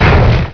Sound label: door punch; ambience; poke646